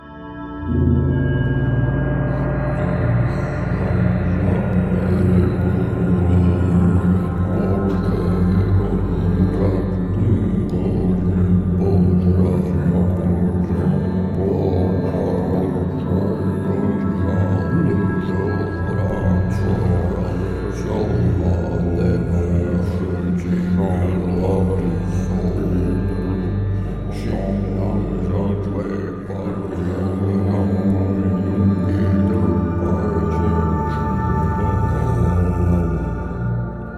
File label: Obstacle Removing Mantra, devotional, meditative